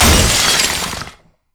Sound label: ring of frost; LoL; lissandra